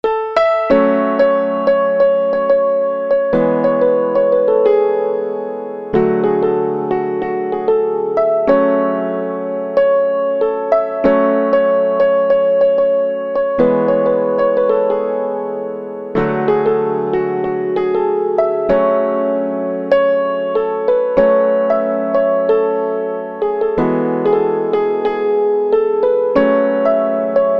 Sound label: Piano